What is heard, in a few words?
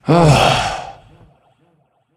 sigh; smite; tiamat